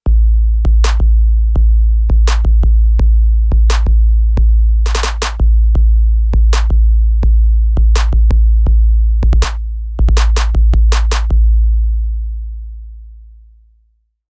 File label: Beat; Roland TM1